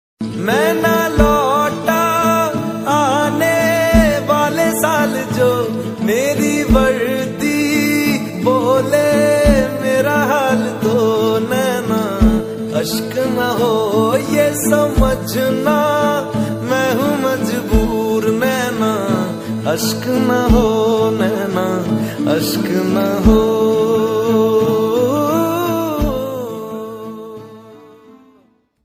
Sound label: Indian army ringtone, army song ringtone, dj remix; army call ring; Best Indian army ringtone 2022